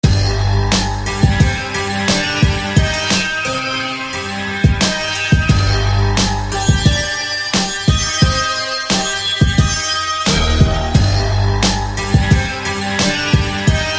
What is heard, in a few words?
Ice Hall Guitar; SFB FX